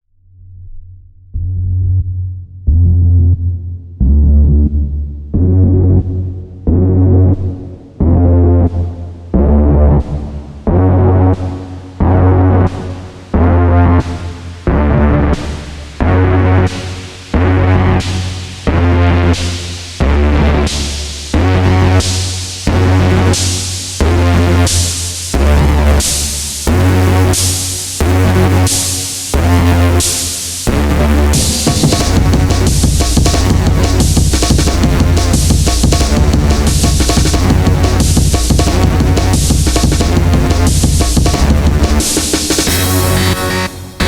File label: Remix Instrumental